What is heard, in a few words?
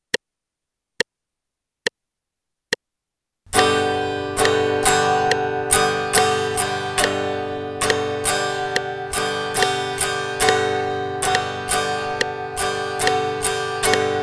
G strum in eighth notes pattern2, guitar